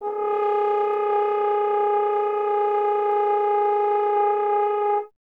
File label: F. Horn; Miroslav Vitous; Solo Instruments VOL-2